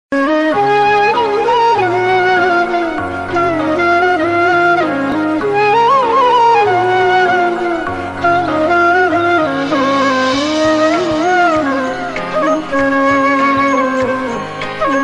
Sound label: krishna flute ringtone; oh my god movie